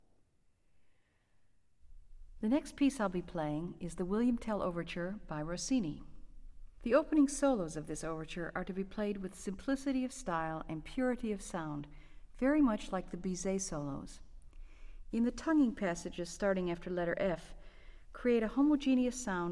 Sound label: Orchestral Excerpts For Flute; William Tell Overture